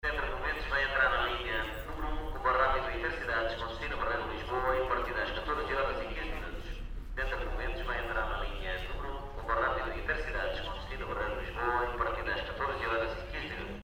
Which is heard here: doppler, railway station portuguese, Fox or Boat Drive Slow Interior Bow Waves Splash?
railway station portuguese